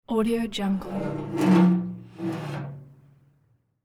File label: metal garbage chute open door